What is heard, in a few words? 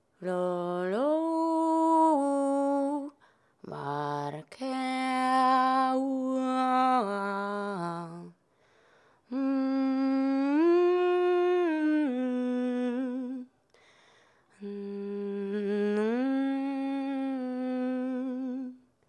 npc female mid sing